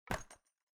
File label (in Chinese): 箱子上锁